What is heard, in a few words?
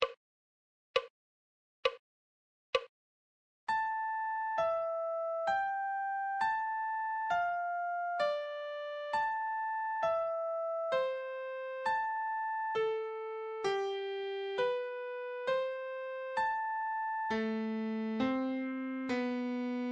musical reading in treble clef Adagio